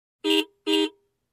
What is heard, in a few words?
Motorcycle honking twice